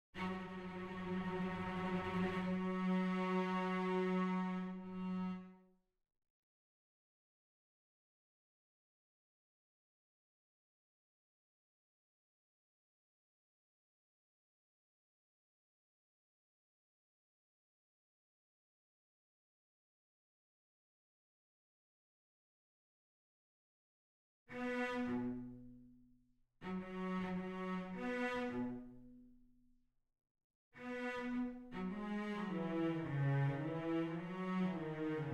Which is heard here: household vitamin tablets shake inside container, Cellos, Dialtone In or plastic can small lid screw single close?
Cellos